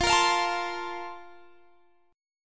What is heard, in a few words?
Bb Major 7th Suspended 2nd